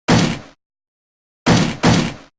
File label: desk slam; franziska